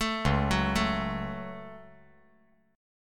C sharp Suspended 4th Sharp 5th C sharp down, chord; arpeggiated; JGuitar